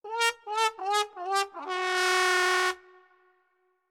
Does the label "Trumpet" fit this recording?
Yes